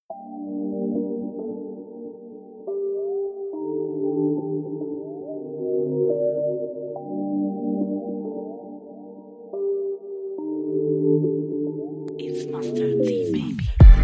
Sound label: Beat